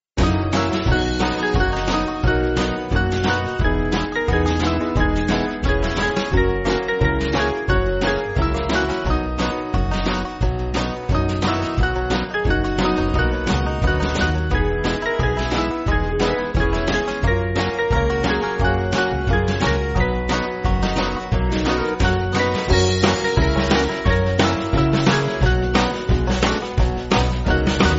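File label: Band, Backing Files for Churches; C.B. Widmeyer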